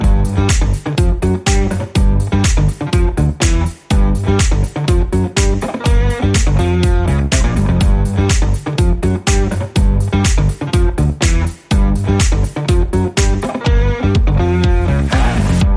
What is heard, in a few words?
Rock